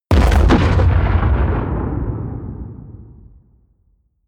bomb rumble close

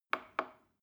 plastic button small click soft slow

